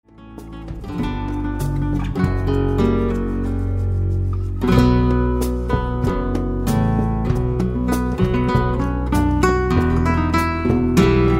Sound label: Jazz Trio